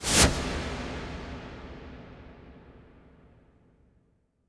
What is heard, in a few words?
IMP WIPE, Cinematic Impact